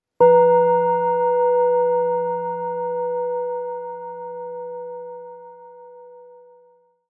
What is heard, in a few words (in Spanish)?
Cuenco sonoro tipo bengali; Alivia stress ansiedad equili